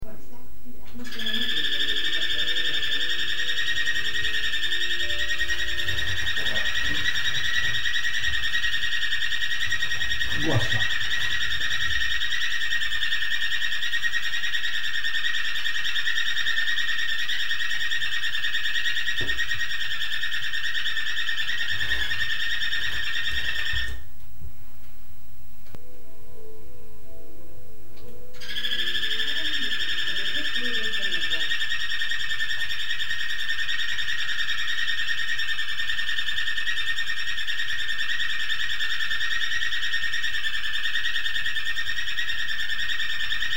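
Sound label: bird cockatiel sings like an alarm clock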